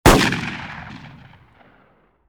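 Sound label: shotgun exterior shoot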